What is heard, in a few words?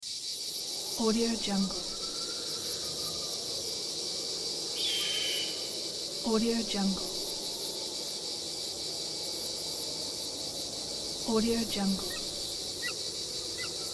desert ambiance